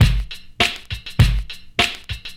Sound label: 134 bpm drum loop g key, Free drum loop sample - kick tuned to the G note. Loudest frequency: 1463Hz